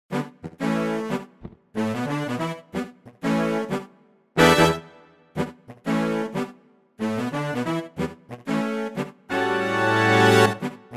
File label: Pop Brass Swell; Montage